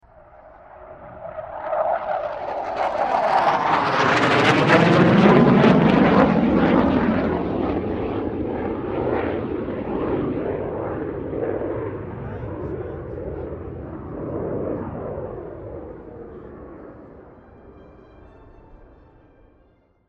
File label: aircraft bae hawk 127 pass; 1997